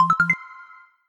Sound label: Stereo Light It Up, notifications